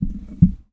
Handle Microphone; Miscellaneous; 1001 Sound Effects

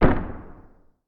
DOOR Sci-Fi Wood with Reverb; mono; Universal Sound FX; DOORS & GATES

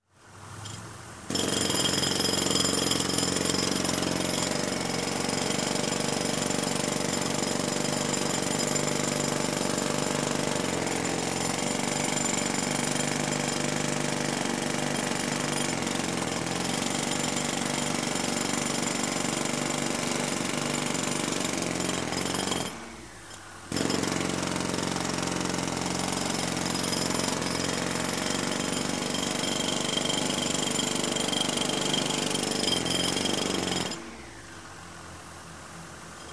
Construction, Jack Hammer Hammering; Sound Ideas 2000 Series

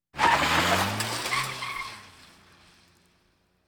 Automobiles various

